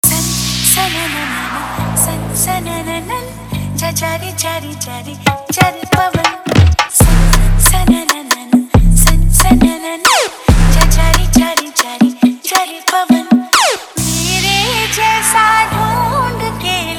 Trap Dance Hip Hop Remix; DjKamalRaj Ayodhya

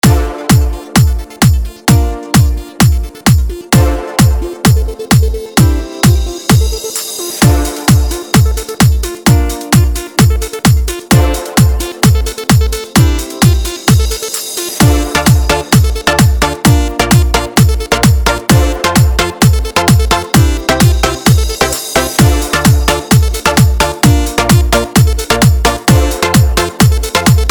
EDM Trance House

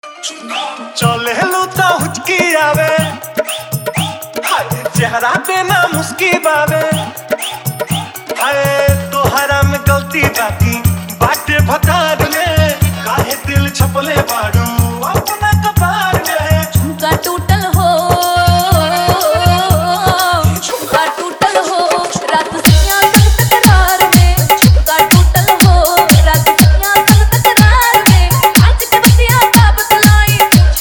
Hard Bass Mix

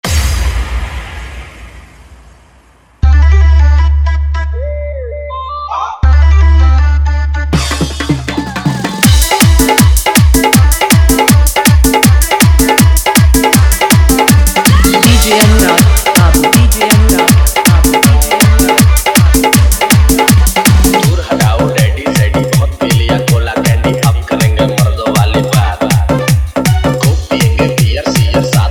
Bhojpuri Hit Full Dhollki Bass Garda Dance Mix

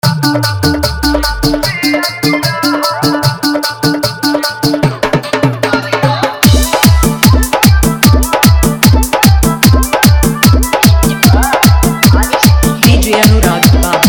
Bhojpuri Holi Hard Dhollki Dance Mix

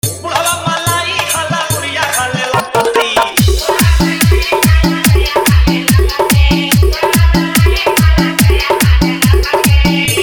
Jumping Dance Mix

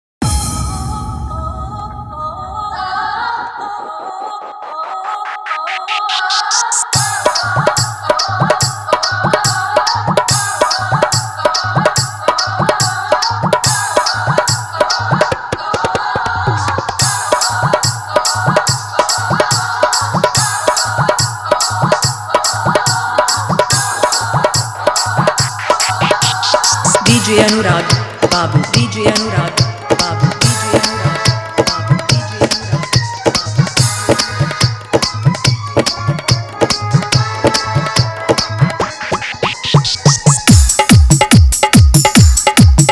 Desi Jhankar Dhollki Bass Dance Mix

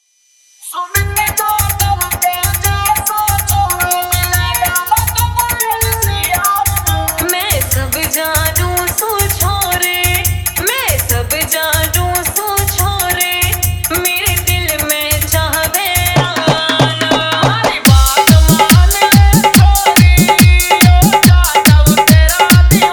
Hard Dhollki Bass Mix

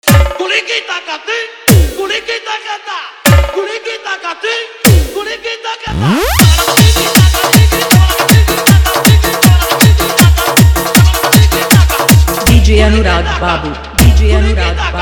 Desi Dance Mix

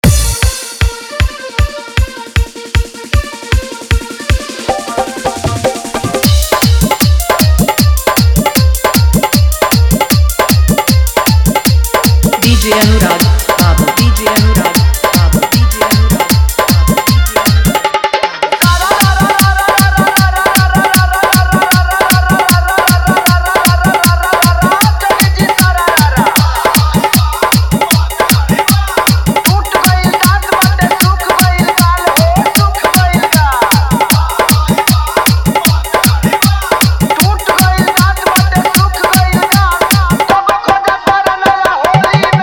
Holi Dhollki Bass Dance Mix

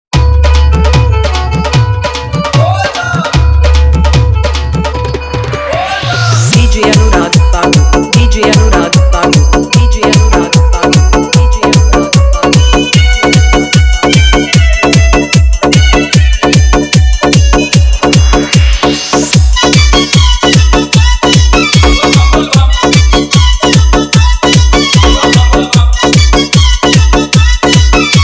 Hard Bass Remix; Mahashivratri Competition SpL; Fully Hard GMS Bass

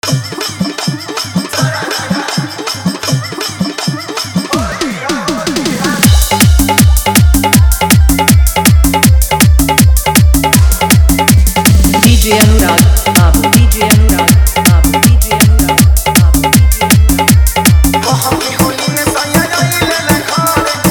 Holi Dhollki Bass Dance Mix

